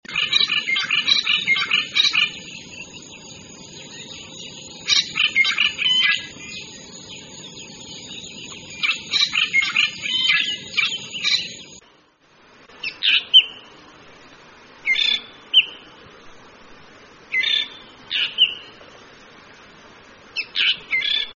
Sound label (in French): drongo brillant, chant